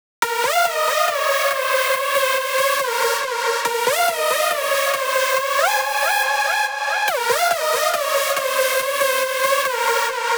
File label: 140BPM G#; Vengeance - Essential Clubsounds Vol.4